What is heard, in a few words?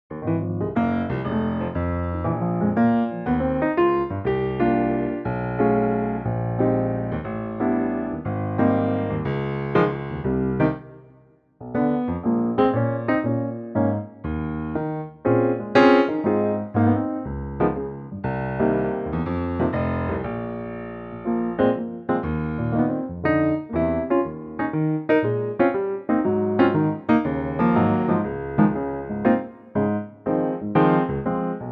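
A flat piano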